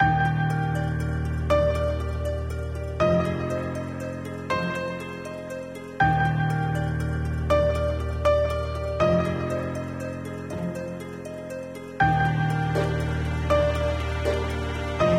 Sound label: bgm event